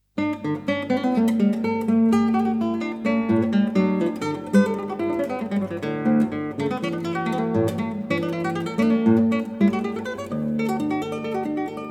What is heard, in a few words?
Keyboard Sonata in D Major Arr for Guitar; K 178